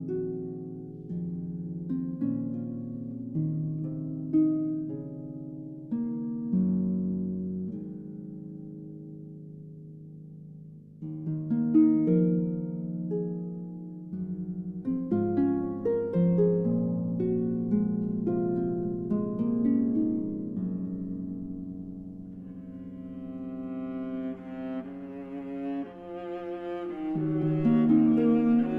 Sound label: Harp Concerto Lullaby